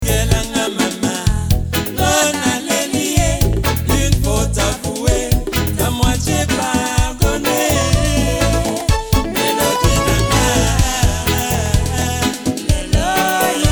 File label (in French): melodie